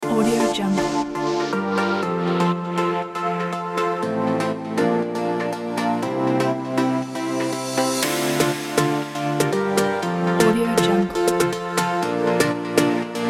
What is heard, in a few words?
dance summer